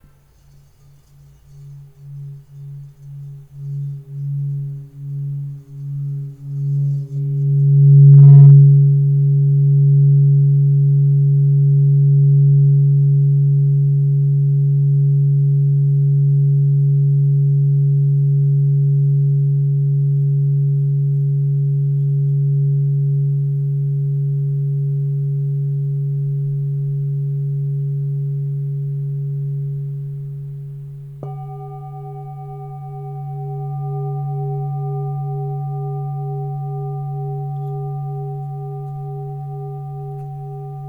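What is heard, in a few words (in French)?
bol bengale 1.3-1.4kg Do dièse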